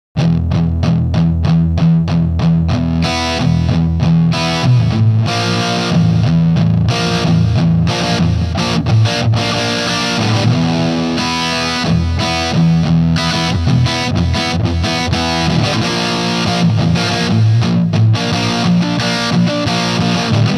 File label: intro, Cornish P-1, Hiwatt, Eclipse Cab, delay